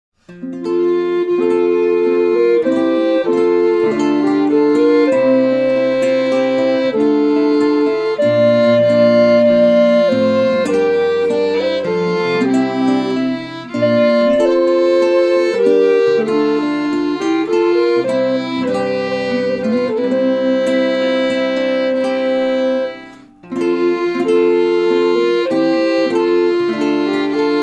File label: courante